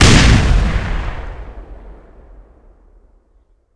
explode; valve

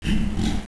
gorilla

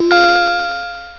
bling; valve

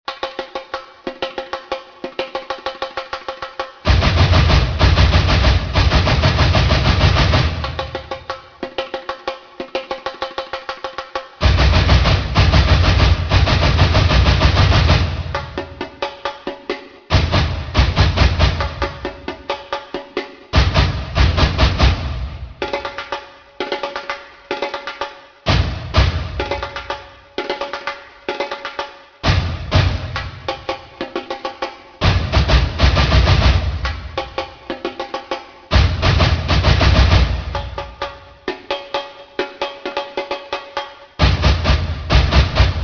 batucada; valve

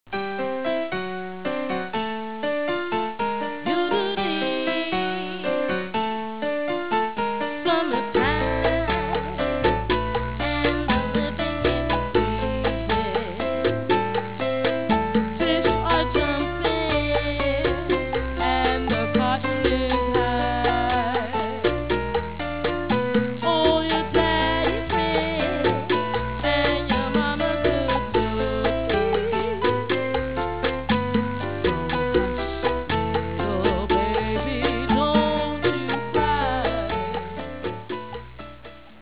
piano; valve; kong

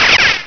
peeeow; valve

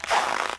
snow; valve

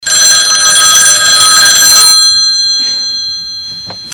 phone ring loop; garrysmod; prop hunt; ob nightmare compress

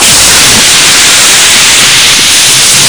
cannister loop; garrysmod; prop hunt

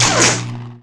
electro

